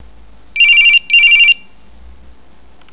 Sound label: Ringin